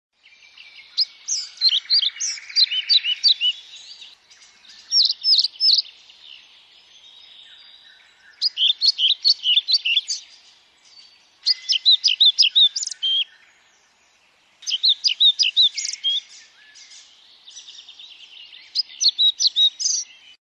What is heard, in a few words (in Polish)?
Mucholowka zalobna, Ptaki Glosy; Muchołówka żałobna